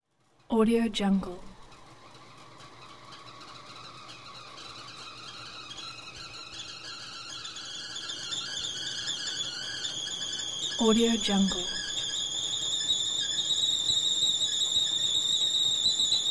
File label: the kettle boils and whistles